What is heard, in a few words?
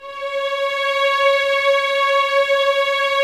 C#5, 11 Violins, Soft; Miroslav Vitous